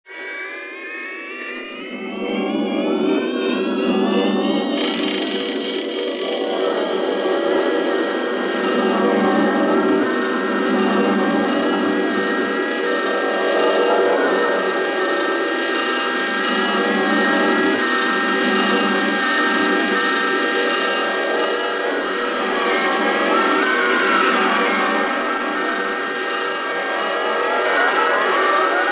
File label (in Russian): zvuki mashiny vremeni ili teleporta